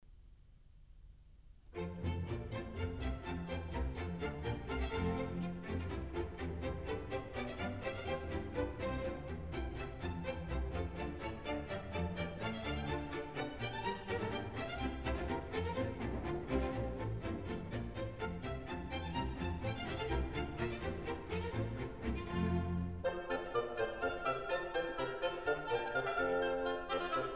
Water Music Suite

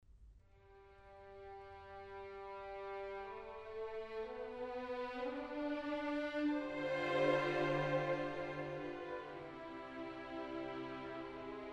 Serenade for strings in E Minor; Edward Elgar

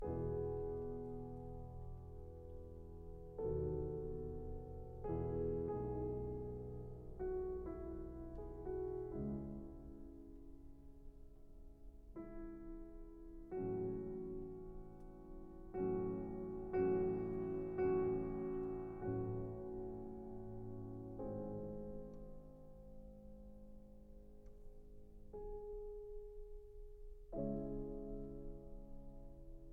Piano Concerto No. 3 in C Minor; Op. 37; Beethoven

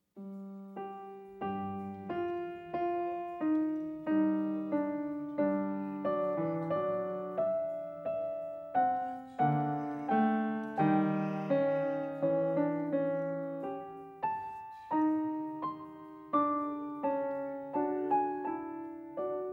Goldberg Variations BWV 988 Variation; Bach

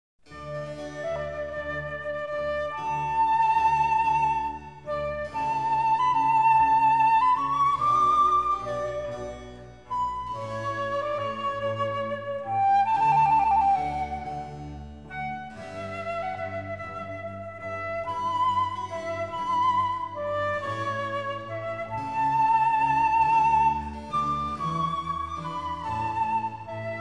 Flute Concerto In D maj; Vivaldi

